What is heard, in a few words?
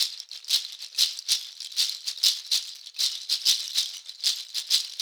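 shaker, Synclavier Percussion, World & Orchestral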